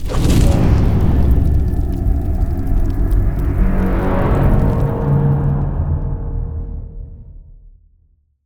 Altar Summon

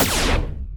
Plasma Rifle Alt

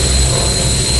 Photo Use Sound

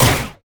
Shoot

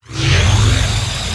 Charge

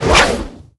Spear Throw

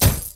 Coin Shot

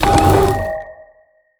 Sensor Array Install; Long Range Sensor Array

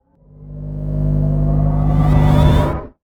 Gauss Nuke Arm Charge; Ares Gauss Nuke Arm

